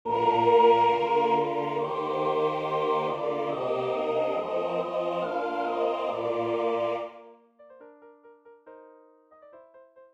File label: Kyrie tutti; Haydn